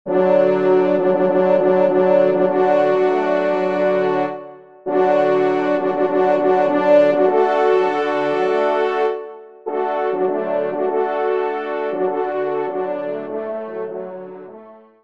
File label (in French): Grande Fanfare, trompe; Auteur : Paul Laugé; Trompes et/ou Cors en Ré